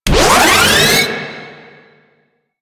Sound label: Puzzle Switch Shock Warning; Missions; Raid